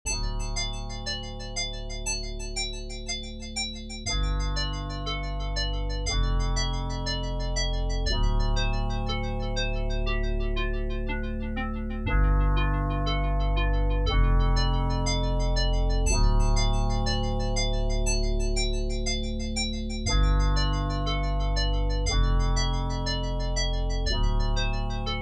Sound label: Menu Loop; No Wall High Enough